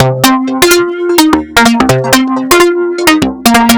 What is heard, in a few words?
Synths; Underground UK House & Electro; Sonic Foundry (Sony Creative Software)